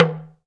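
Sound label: Talking Drums; Roland - LA Composer vol. 1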